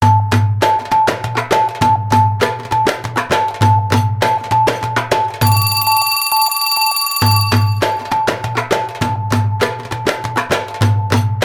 Strange Tapping, alarms

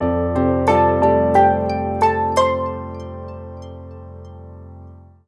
power on; Samsung; SCH-W599; Anycall

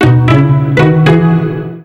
Orange SMS, phonetones; Orange Poland

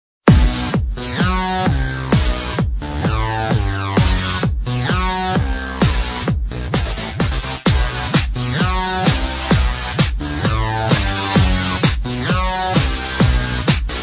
Techno, Melodies; LG550 Fusic

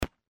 Dart Hit Board; LG GD900 Crystal; Game Sound

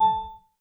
Class Harp, notifications; Oppo Find X5

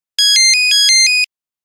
Do mi so, Nokia Buzzer; Windows Phone apps

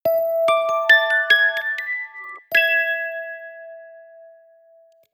turn on; SK telesys SK-S170

